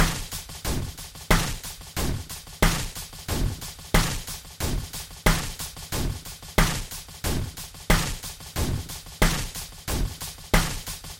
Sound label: Drums